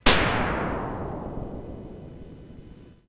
explosion; Dingo The Dragon

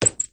dodgeball; Looney Tunes - Recess

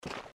step; Keep Out! A Dungeon Adventure for the Brave

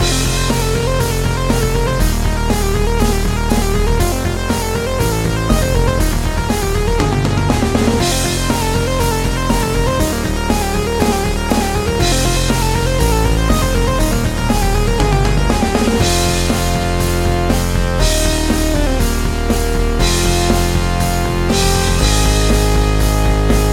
space idea; Star Rocket

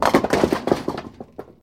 balls hit; Crash the Bash - Drink Break

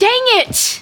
hit

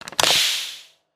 door open level end; Ben 10 - Escape Route

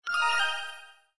seed Sprout; Food Farmer

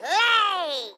eeeeyyyh; Bunnicula - Rescuing Harold

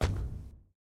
sfx footstep heavy wood; Ben 10 - Omnicode

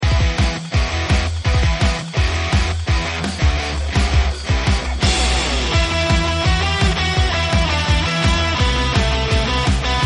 music

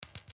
Coin; The Adventures of Puss In Boots - Catch The Thief; NextlevelGames

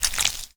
splatter small; Fruit Slicer

